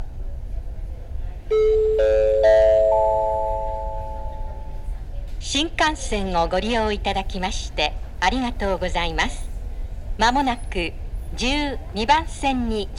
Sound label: maibara hikari, station; jr central; tokyo